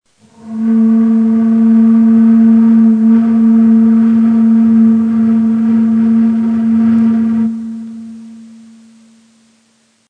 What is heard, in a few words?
Kaba Rast, Ney